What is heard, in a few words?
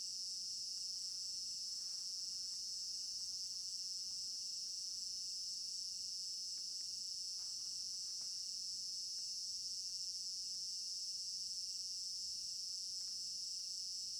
African Village Garden Night Crickets Stereo Binaural